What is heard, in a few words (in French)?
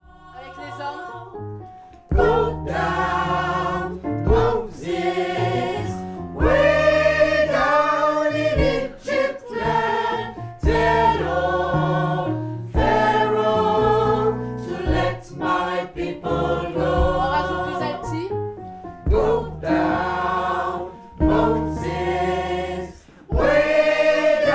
partie homme, gospel; GO DOWN MOSES